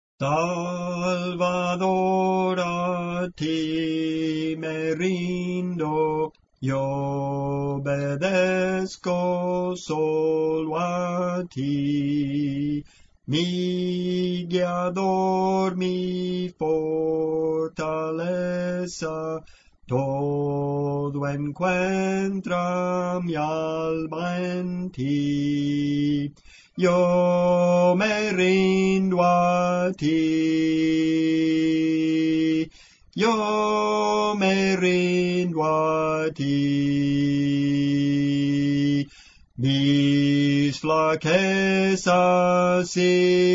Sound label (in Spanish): Contralto, Cantos Espirituales